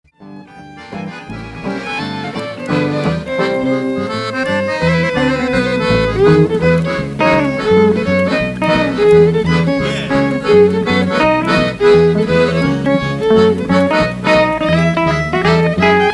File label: mazurkas swing